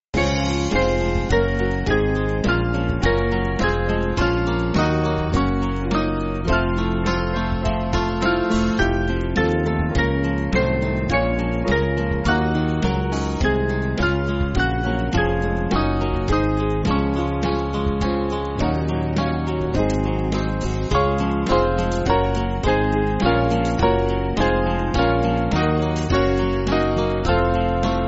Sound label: Band, Backing Files for Churches; O Day Of God; Bellwoods